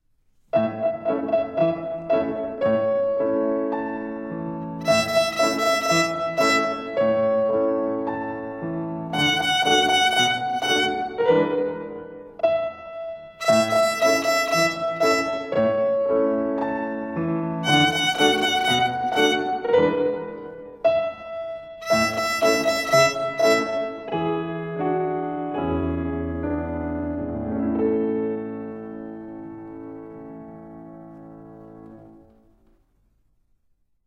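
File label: Rhythm on E and 1, Violin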